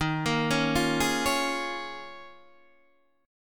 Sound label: D sharp 7th Suspended 2nd Suspended 4th, Guitar, Open D